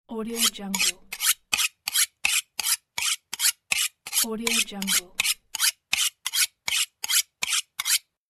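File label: sharpening the knife; sharpenning the knife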